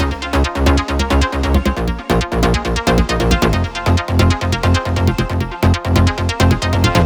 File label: Special Sounds Root F 136 BPM; vengeance essential club sounds-3